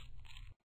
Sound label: slosh; Lewd SFX